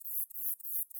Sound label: song, katydids, neduba radicata